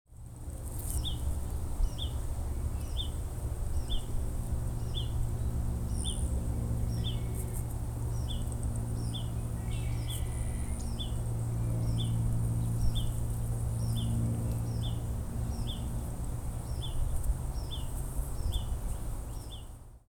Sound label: huttons vireo